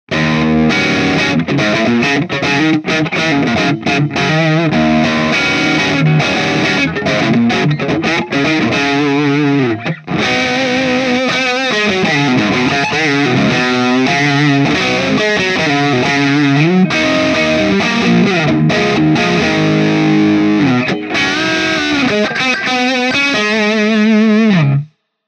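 Sterling Majesty X Neck Humbucker Distortion Boost, E-Gitarre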